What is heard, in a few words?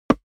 step walk wood solid; foley; smartsoundfx